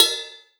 Cymbal Paiste Bell Short; TheBlackPearlV1; pitchglitch

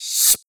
Beat Box Hi Hat Rev

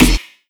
Snare Punch; Einparkinger

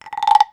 Perc Wooden Frog

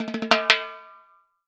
Perc Timbal Roll

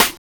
Snare; pitchglitch samples; DNBACADEMY LIQUID STARTERKIT

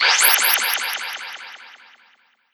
Old Dub

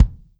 Kick

